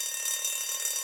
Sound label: Alarm Bell